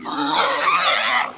boar death; Giant Boar

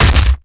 Collisions Melee Impact; Lurker; Evolve; Gorgon

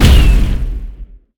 Bomb Explode; Destroyer; Terraria

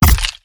Teleporter Melee Impact Base; Evolve; Wraith

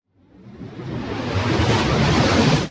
Spirit Walk Charge Up; Lurker; Evolve; Gorgon

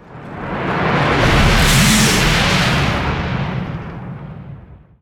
Lightning Strike Activate Start; Evolve; Kraken

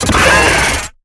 Pain Light; Evolve; Gorgon; Lurker

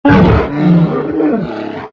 bear dead

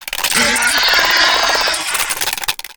Spider Trap Activate; Evolve; Gorgon

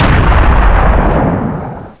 fire

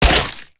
Teleporter Super Nova Blood Splat; Evolve; Wraith

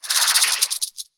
Acid Barrage Rattle; Lurker; Evolve; Gorgon

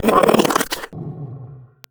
Exertion Heavy; Evolve; Kraken; Grounder3

